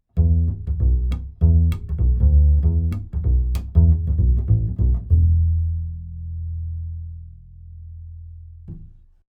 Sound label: AKG414XLS Upright Bass